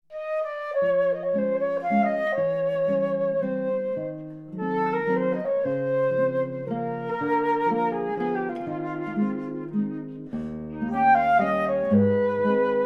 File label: waltz Ab